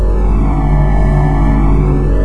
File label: teleporter